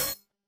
breaking pot; Environment

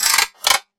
crossbow reload; Weapons; freepixel

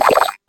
slime bounce; Creatures

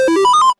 player leave; Multiplayer

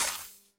plant seed; Farming

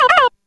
dialogue end; NPC Dialogue

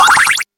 buff, magic

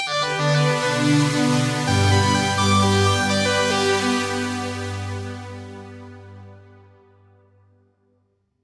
win jingle; freepixel; Wordacted

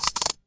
item equip; Items & Pickups

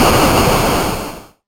bomb fuse; Weapons; freepixel

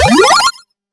tower upgrade; Tower Defense; freepixel

